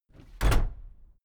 case lock close reverberant; objekte; tueren

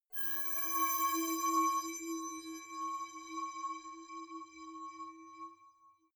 metal high brass stick singing; soundpool

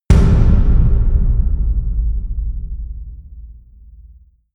huge tom hits; music elements; percussion

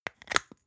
spice jar smooth close creak; industrie wirtschaft; gastronomie

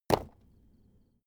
stone small impact; natur-tiere; steine

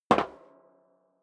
step sneaker metal rattle single right

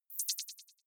click decay, signale

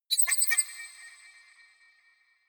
notification hectic, signale; kommunikation

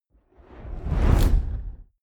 fire whoosh tonal; swooshes-swishes

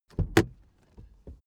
suv open door

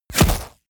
bullet small impact wood scatter; krieg-waffen; geschoss

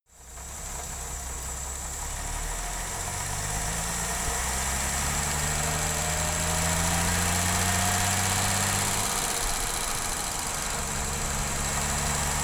car jaguar e-type accelerate soft engine

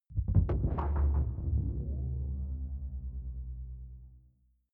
sci-fi alien splash impact dark; swooshes-swishes

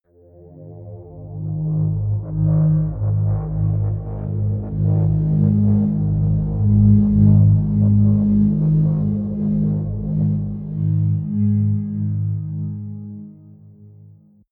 atmospheric feedback pad drone; musikelemente; drones

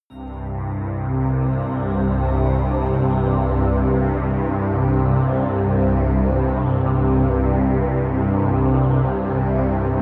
pad sweep gentle; flaechen

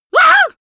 shock shout male; vocals; comedy-cartoon; soundpool

